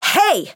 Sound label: damaged; sherinu